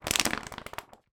household telephone directory pages flick through

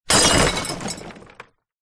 glass bottle hit junk in plastic bin smash; impacts

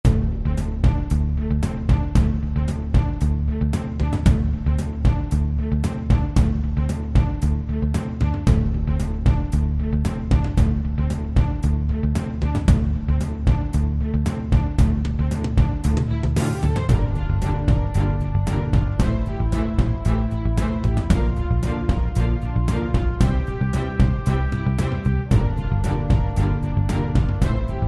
music theme current affairs

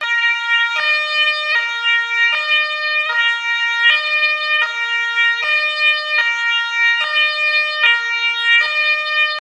POLICE Siren EU; TRANSPORTATION

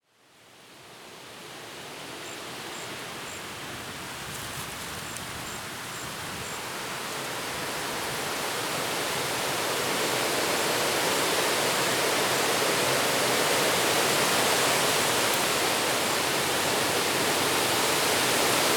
nature wind strong trees woods cricket

